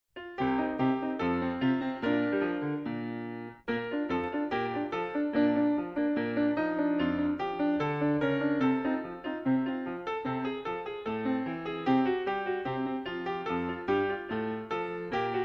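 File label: piano accompaniment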